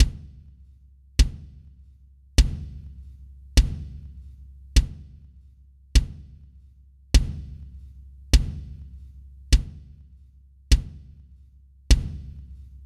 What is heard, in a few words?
parallel kick